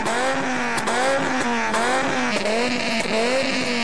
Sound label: Volvo T5